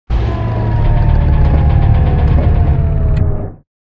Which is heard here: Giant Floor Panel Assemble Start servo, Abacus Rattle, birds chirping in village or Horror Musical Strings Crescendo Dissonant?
Giant Floor Panel Assemble Start servo